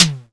acoustic tom drum single hit E key, tom sample tuned to the E note. Loudest frequency: 3774Hz